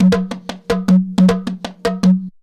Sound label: JUNKU RIFFS; Best Service; World Colours